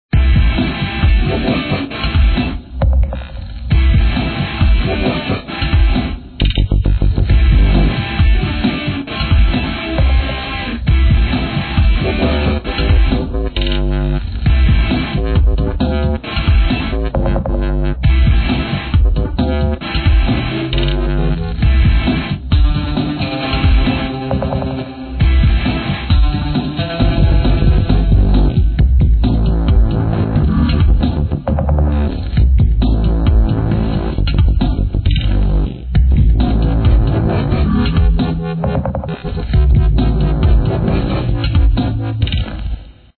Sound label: menu music; coolmathgames